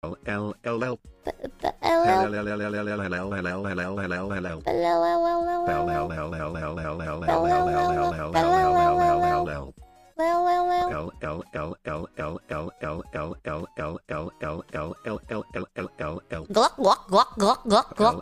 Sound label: cute noise nvm